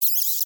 insect chirp vocalization; sound effects one

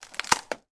impact thin wood snapping

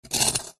nature ice block sharp knife scrape

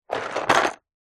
leisure dominos wooden in card box fall over

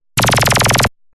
Laser Like Synth Rapid Laser; sound spark

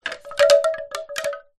household wind chimes wooden pick up

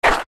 footsteps skid street rubber sole shoes; foley

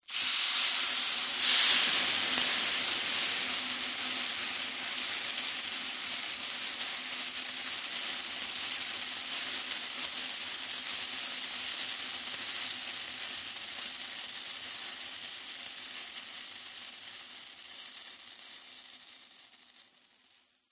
nature water hit hot metal sizzle bubble

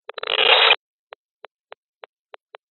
science fiction radio signal interference burst

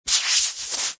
human bare feet skin rub together

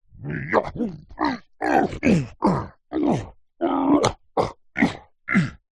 fantasy giant male vocalisations fighting

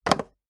household telephone landline receiver hang up

